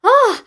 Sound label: voice kid hit